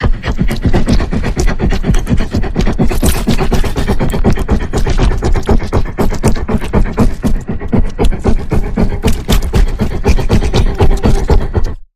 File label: Cerberus Panting Pulse